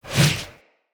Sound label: Wolf Paw Swipe; Animals; Attack